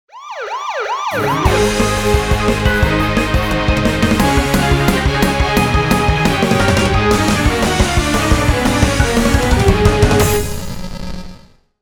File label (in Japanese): お空ジングル